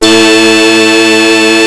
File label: OB 19 A3, Rare Synthesizer; Hollywood Edge - Giorgio Moroder Rare Synthesizer Collection; OB 4 VOX.